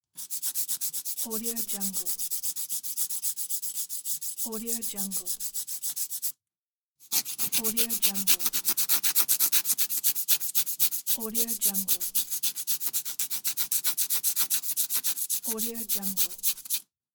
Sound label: filing fingernails; soundseeds